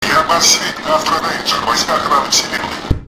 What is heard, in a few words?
alert; slender